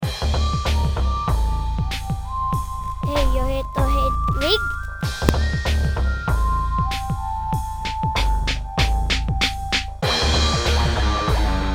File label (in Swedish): guitarr